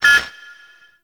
Interior Orb Station Console Count; Warframe; Tau; Orbital Station

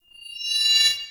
Cannister Throw Riser; Enemies; Abilities; Warframe; Tau; Dax Archer

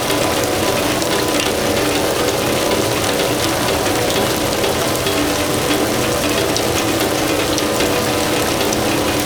Sea Lab Waterfall Metal Loop; Warframe; The New War

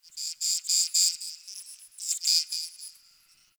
Cricket; Warframe; Tau

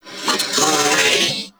Purgatory Warrior Possess Scream; Warframe

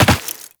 Land Rock, Footsteps; Warframe; Tenno

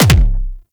Pistol Punch; Temple Signature; Warframe; Tenno

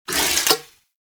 Shotgun Fire Stop Mech; Warframe; Tenno; Alchemist

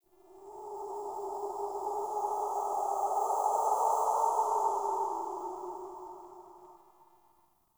Ext Wind Death Howl; Ambience; Misc Outdoor; Warframe; Infested Eris

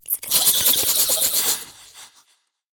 Girl Idle A; Warframe; Zariman; Skitter Girl

